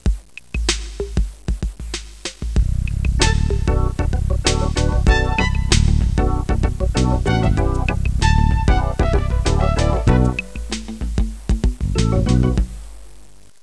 suonerie musica jazz

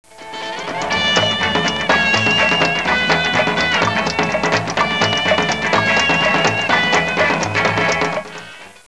musica strumentale

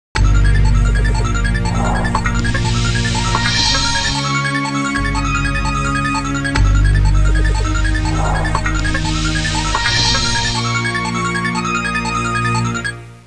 musica elettronica; electronic

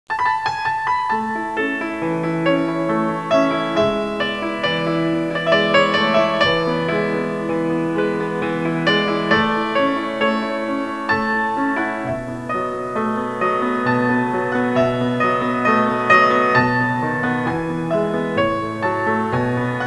suonerie classica; musica classical

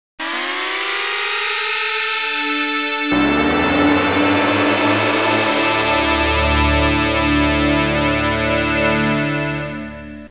audio suonerie musica mistica

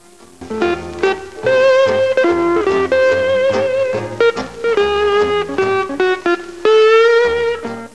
musica strumentale

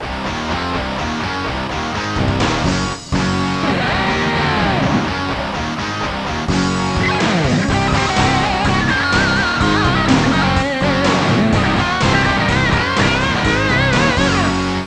suonerie musica rock

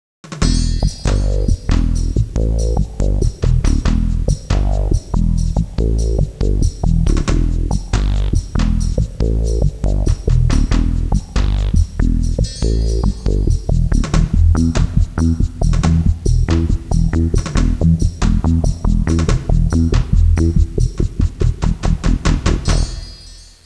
suonerie musica ritmica

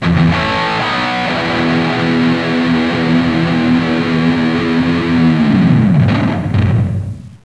suonerie musica rock

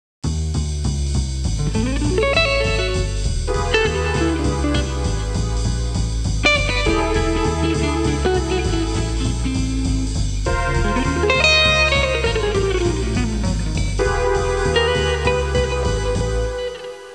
musica urban

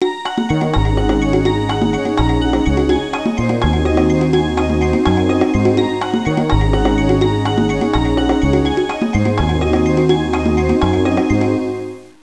suonerie contemporanea; musica contemporary

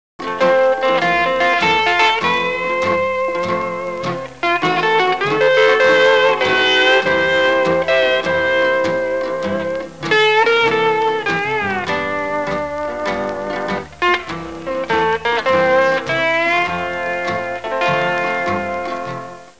musica strumentale

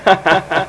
suonerie persone risate

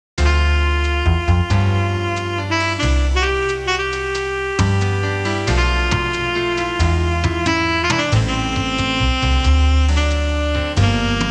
suonerie musica jazz

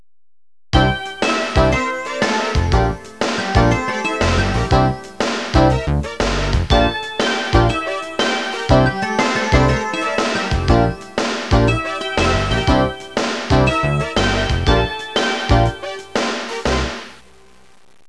suonerie businessy

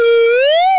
Scivolo Su, cartoons

